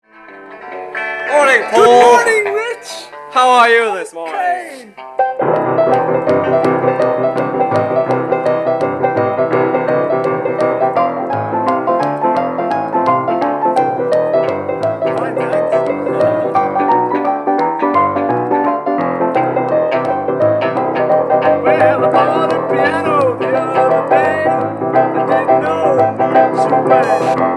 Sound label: Jazz Piano Intro